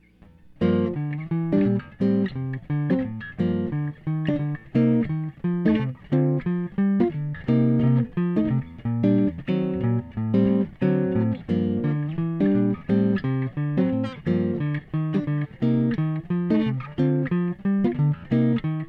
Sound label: lullaby comping